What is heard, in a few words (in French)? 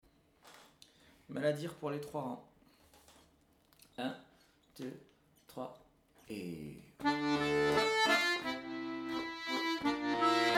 Gavotte